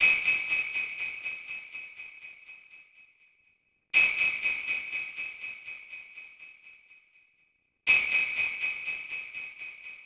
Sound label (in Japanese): suzu; Higurashi no Naku Koro ni Matsuri; PS2